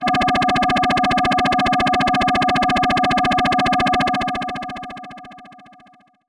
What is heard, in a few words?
Helicopter FX DX100 As4